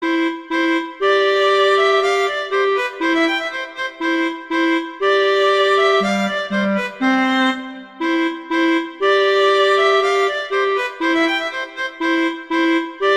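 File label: Duet, horn; Mozart; Duets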